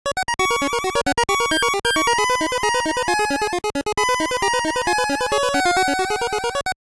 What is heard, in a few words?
spin; lancer; deltarune; NOELLE